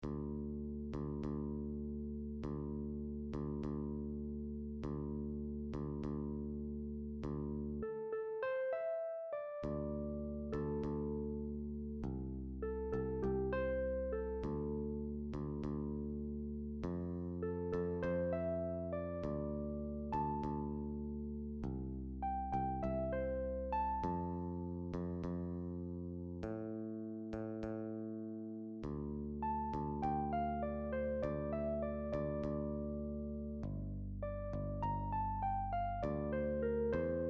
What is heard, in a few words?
Uke